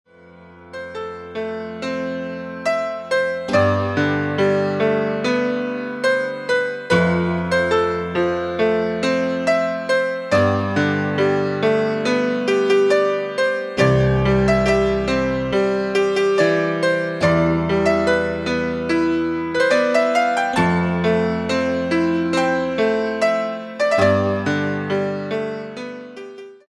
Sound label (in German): Vergiss mein nicht Melodie; friedrich fledermaus hoerspiele tiere und fledermaeuse